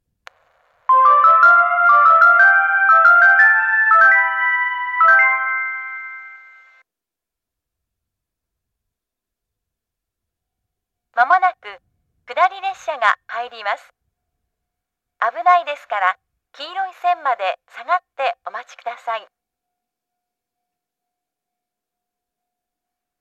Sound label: uguisuno kudari sekkinn